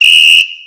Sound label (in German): Trillerpfeife